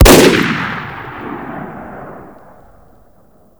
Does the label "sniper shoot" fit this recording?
Yes